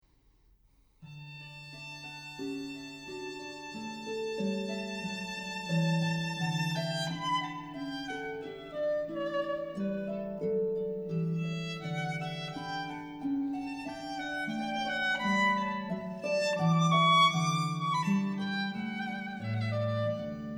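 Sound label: Sonata for Violin and Keyboard; Andante; J.S Bach; 3. Andante; Sonata No. 1 in B minor, BWV 1014 for Violin and Harpsichord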